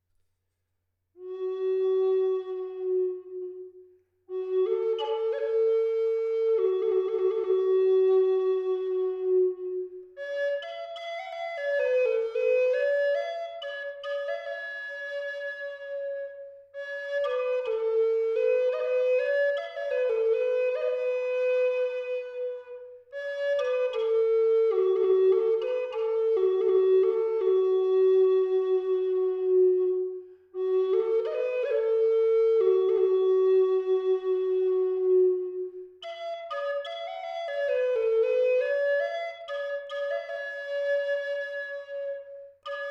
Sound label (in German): Haselnussast, G mittel 432Hz